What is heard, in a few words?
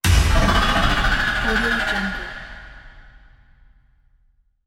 ambient horror cinematic; umcsound